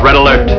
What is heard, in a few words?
red alert; startrek